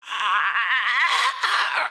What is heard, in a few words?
Female zombie; Zomboid 41.78.16; Project Zomboid